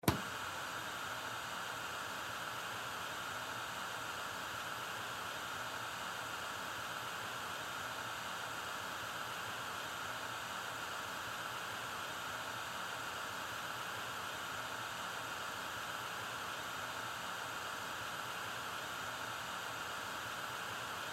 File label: Dentist Nitrous, Dentist Nitrous Gas On Run Off; Hospital; Emergency